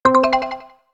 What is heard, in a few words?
Alert, notifications; Coolpad; Note-8